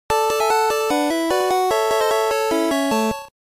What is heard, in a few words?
Fanfare Victory; Tetris (Game Boy)